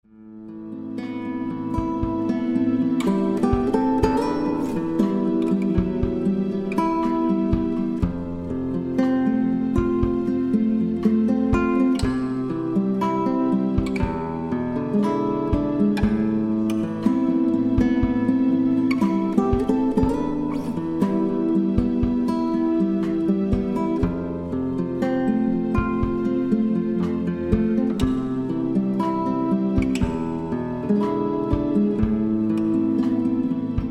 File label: Balade arpeggio